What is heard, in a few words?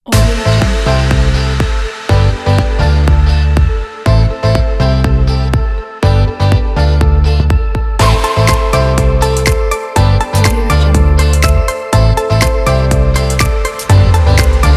energetic fun pop